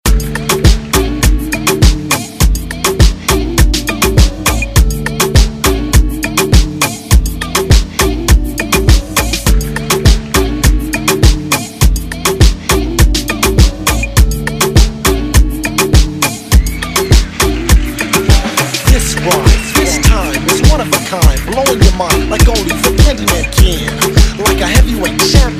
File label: 80s Moombahton Redrum